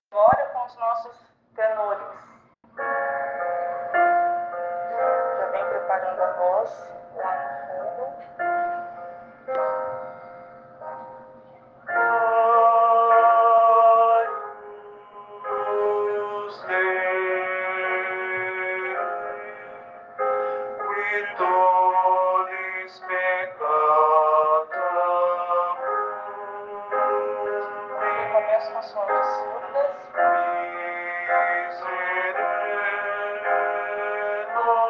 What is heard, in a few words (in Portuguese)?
agnus tenor; coral sao francisco; missa vigilia pascal